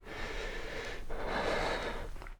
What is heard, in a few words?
Player UI, sfx; Five Nights At Akers; soma